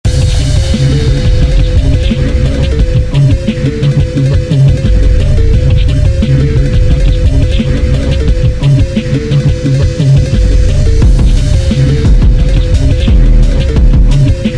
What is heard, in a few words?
Phonk bass Boosted